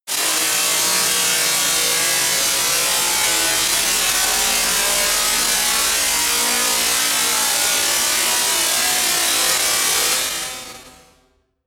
buzz saw long

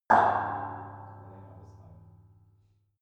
metal impact tank inside

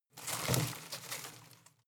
home plant leaves rustle rattle short

